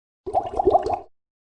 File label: Potion Drinking